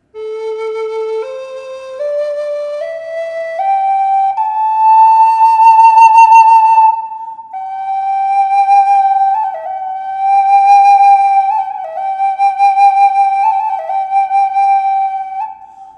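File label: reverb, flute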